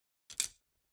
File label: Grab arrow